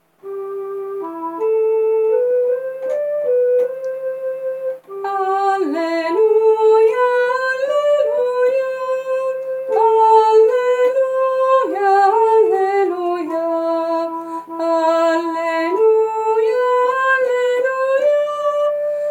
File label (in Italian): Alleluia alti